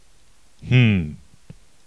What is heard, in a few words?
HMM